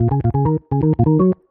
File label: ORGN 123, Keys; Houseworx